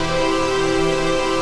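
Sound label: chord pad, Pads; Best Service; XX Large - Pads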